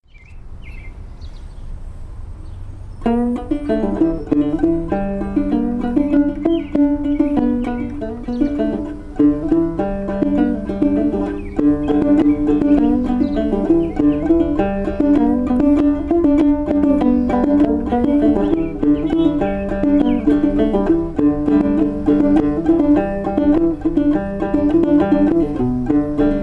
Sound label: Gourd